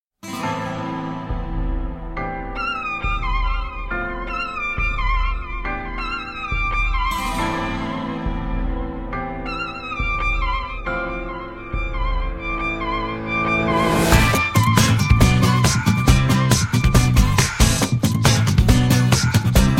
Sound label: Acoustic Version